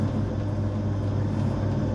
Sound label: Machine Loop